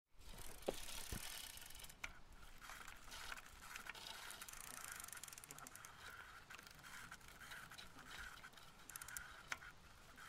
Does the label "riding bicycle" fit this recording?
Yes